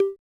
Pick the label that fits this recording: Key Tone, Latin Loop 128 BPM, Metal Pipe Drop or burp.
Key Tone